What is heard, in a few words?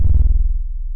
boomer, imp; doom3